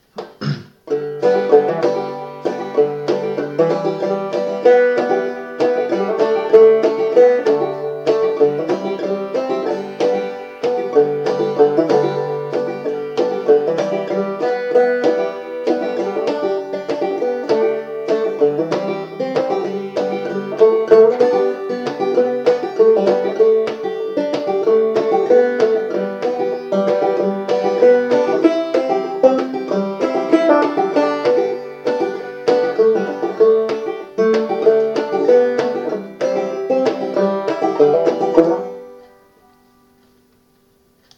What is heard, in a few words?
banjo, Banjo: Open G; Capo 0